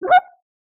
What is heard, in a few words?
Squeaky short, Mograph Mini Transitions; CinemaSpice